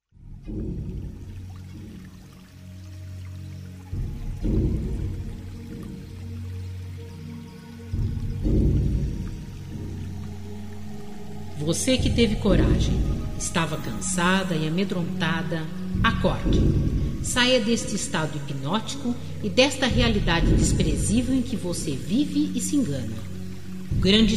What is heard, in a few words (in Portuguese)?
Acorde